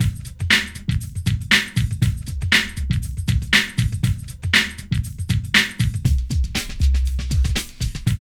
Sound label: PHAT LOOP; E-Lab; X Static Goldmine 2; akai